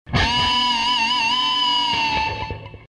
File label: guitar